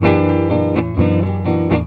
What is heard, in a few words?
EGUIT BREAKS; Best Service; World Colours